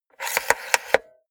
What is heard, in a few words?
cassette player antenna extend fast